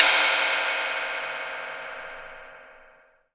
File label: Cymbal, Hip-Hop, Drum Kit